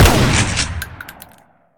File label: shotgun fire